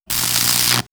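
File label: Magnetic Field, electronic fx; X-Static Goldmine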